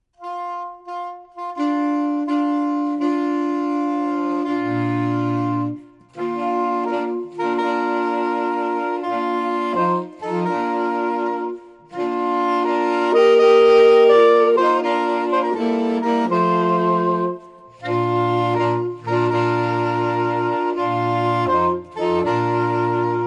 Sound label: bridal chorus